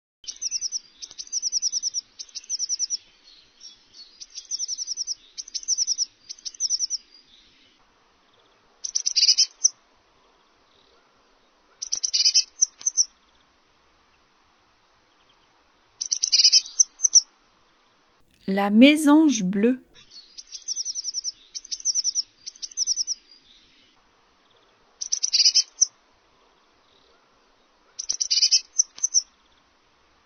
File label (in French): chants et cris d'oiseaux communs; blind test savoir reconnaitre 18 chants et cris doiseaux communs